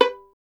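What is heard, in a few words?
STR violin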